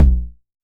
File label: TOMS 909; Electronic; Wizoo - Platinum 24 Electronic